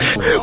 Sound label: breath